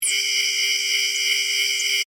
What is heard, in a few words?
Scanner Beam Ray, Scifi; Tech; Sound Effects:Science